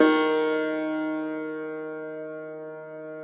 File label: Thumb Tack Piano ff +ped, D1; Best Service ProSamples vol.53 - Classical Prepared Pianos; Total Piano